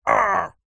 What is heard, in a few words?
human male vocalisation hit pain arhh